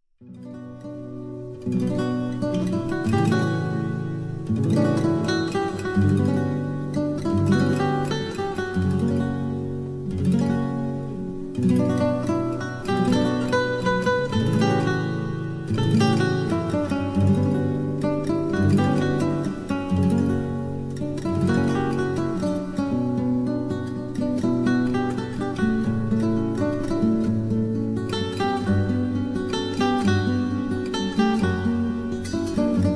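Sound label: Spanish lament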